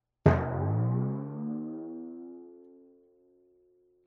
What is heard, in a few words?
Glissando Up